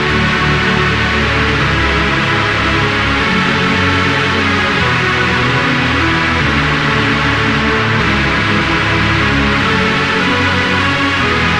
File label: Chord; Flame Force; Routed Sound; Vital Expansion - Flame Summoner Vol.1